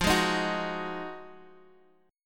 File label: F Minor 7th Flat 9th; Fm7-9 Chord {X 8 6 8 7 X} strummed